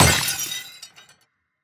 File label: Glass Impact; Damage